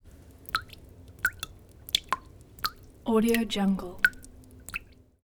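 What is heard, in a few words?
water drip water filled bucket close up constant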